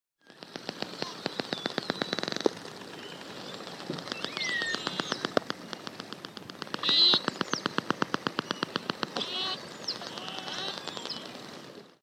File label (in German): Grindwal, walgesaenge; Walstimmen